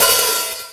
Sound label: Jomox Xbase888; Drum Machines